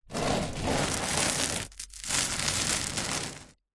container tub plastic heavy short slide gritty concrete